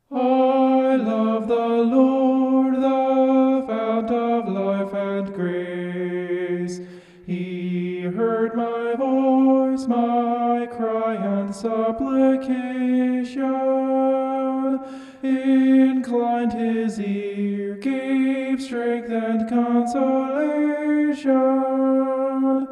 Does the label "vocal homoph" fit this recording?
Yes